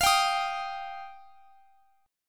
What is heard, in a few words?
F 7th Suspended 2nd F down